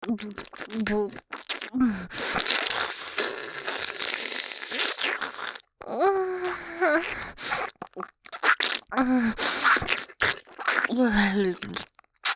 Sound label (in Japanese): 右耳フェラ; 激あまドエロなママショタ生活♪; 豚骨ウェーブ; バイノーラル; 即ヌキ